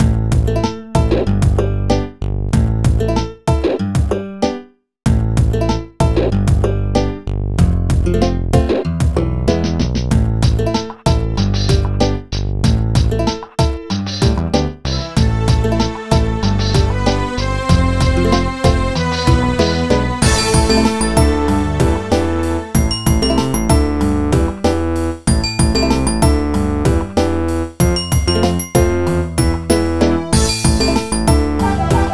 Music BPM100; Super Scribblenauts 2010 NDS; LVL10 MEDIEVIL1

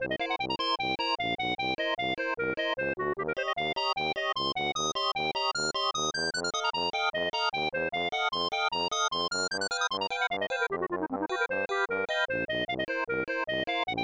Mouse Maze Level Music; Fun 'n Games; SNES

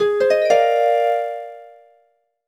Puzzle Clear; imi made wakaru otona no jukugo renshuu kadokawa ruigo shinjiten kara 5 man mon; nds

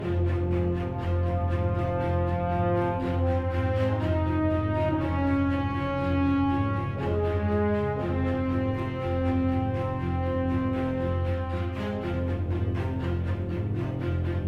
Enemy Fight Stem; Rocky Valley Enemy Fight 1 Stem 1

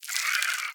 Egg Shake Louder; Devour; The Inn

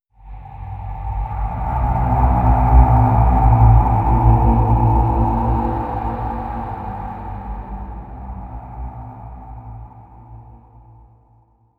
environment graveyard stinger winds short

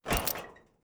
Impact Metal Heavy; adams venture origins

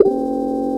rotate error; through abandoned 2 the forest 2016 gamerip pc

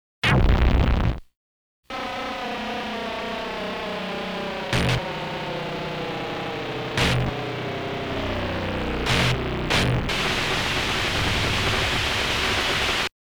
Coin ~ Emergency Landing; IKARI; Legend of Game Music 2 Platinum Box

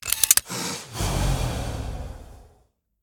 Gunshot Warning Idle; Devour; The Town

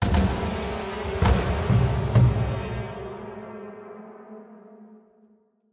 Start Stinger Brass; Necropolis

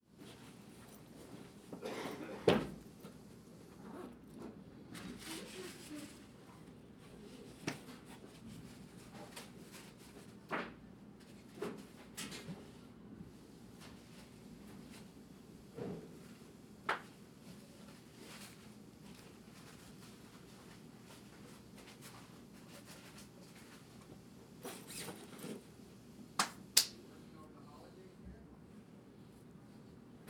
Locker Room

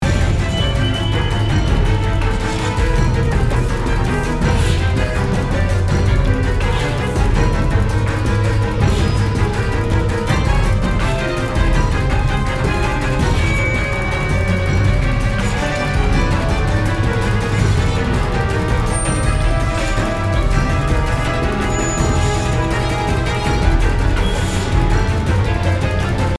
Action loop

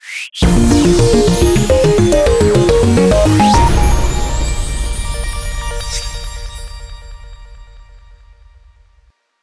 Jingle Win; UNO

